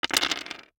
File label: DICE on Hard Wood, Throw and Roll, Standard, 6 Six Dice; Samples; ArticulatedSounds; DICE Sfx Party (Extended)